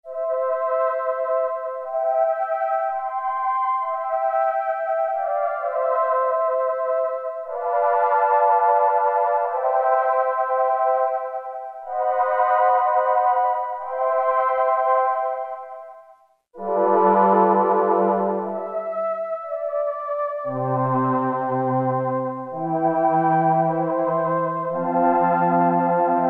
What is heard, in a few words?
CHORUS, Oberheim OB-8; Factory Patches